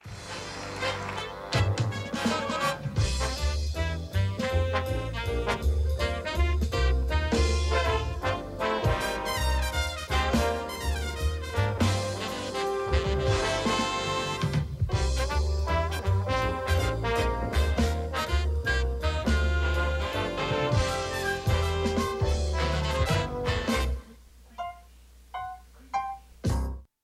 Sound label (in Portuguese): vinheta original; jo soares